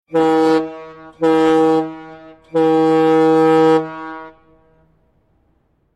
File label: boat horn